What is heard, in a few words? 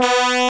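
trumpets; CMI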